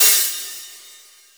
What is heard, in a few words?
Cymbals